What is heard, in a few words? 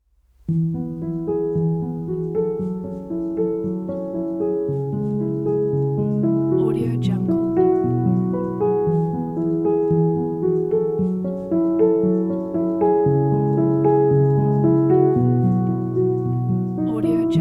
inspiring neo classical piano